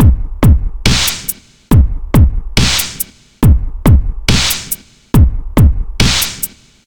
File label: Drum Break